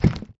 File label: magma cube attack; mobs; mobs mc